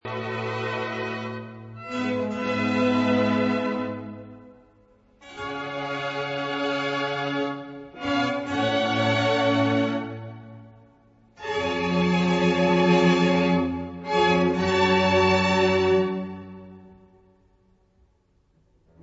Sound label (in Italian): Largo e staccato